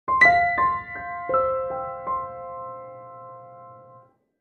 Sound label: Piano Notes